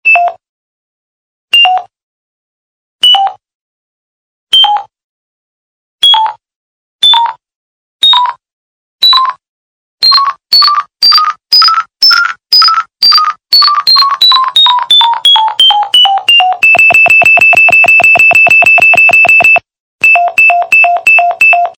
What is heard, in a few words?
alarme clock ringtone